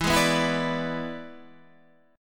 E 7th E down

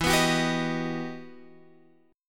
E Minor Major 9th, down Guitar Open F; strummed; JGuitar

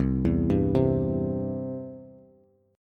C Major C down Bass; arpeggiated; chord; JGuitar

